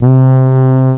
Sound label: FR HORN C2; Alone in the Dark Demo; Adventure